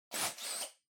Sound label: Blowout Far